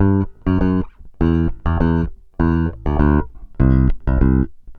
BAI BS G, BASS BAION

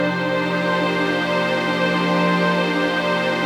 CHRD PAD; Best Service; XX Large Pads; Sample CDs

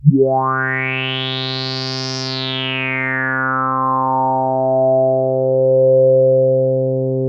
TS10 Analog, Synthesizers

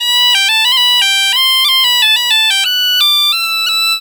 EM BP, Celtic Flavours, BAGPIP090; USB Soundscan vol.42; AKAI

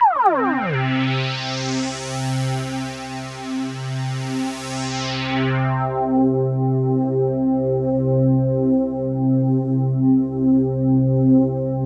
ASSASIN C2, SYNTH; AMG; Global Trance Mission VOL-2

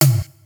DR-660 FX; Rubber Chicken Software Sample Bank

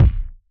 big beat kit kick

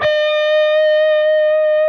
PRS E, Guitars, LEAD; Spectrasonics; Hans Zimmer Guitars VOL-1

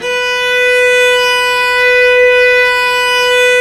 STR VC Arco Solo p

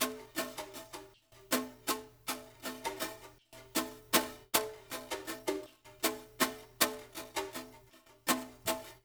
BRSH CG, CONGAS BONGO; Spectrasonics; Liquid Grooves 1 & 2

